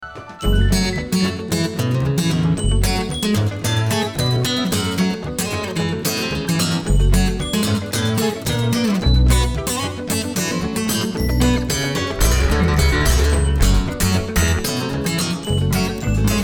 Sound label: Riff